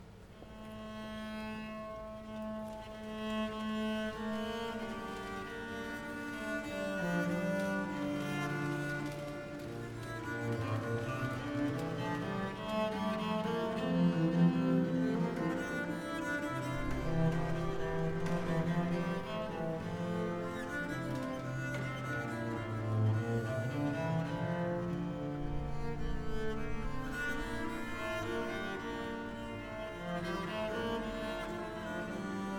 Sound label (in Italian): Expectatio iustorum per viola da gamba e violone